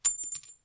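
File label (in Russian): гильзы от огнестрельного оружия ударяются о землю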